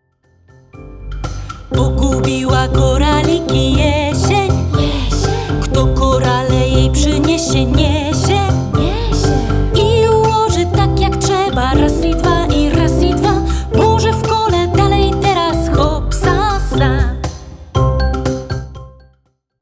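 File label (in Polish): korale jesieni wokal fragment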